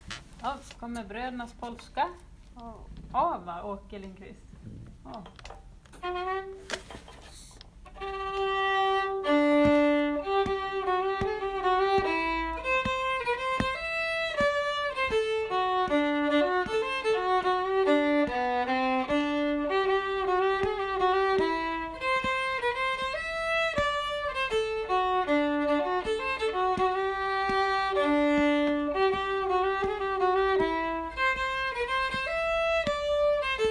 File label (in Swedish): polska; Brödernas